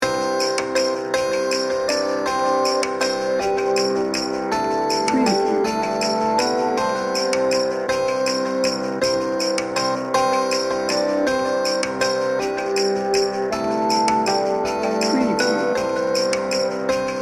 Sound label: good king wenceslas